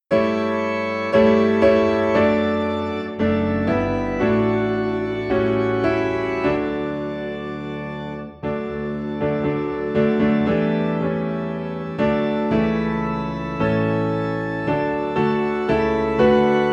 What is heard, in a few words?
Piano and Organ - High in D